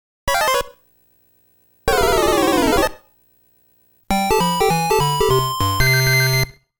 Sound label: Miss ~ Game Over; Game Sound Museum Namcot; Dig Dug; Dig Dug 2